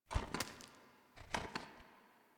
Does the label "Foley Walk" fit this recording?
Yes